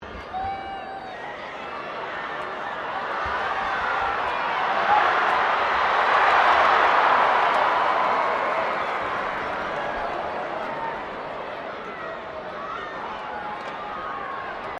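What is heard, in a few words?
crowd 15K Rugby World Cup cheer surge, Rugby world cup game; Crowd Sound Effects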